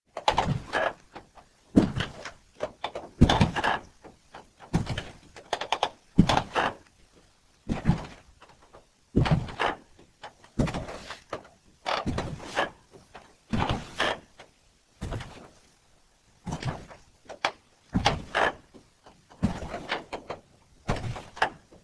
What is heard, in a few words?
rug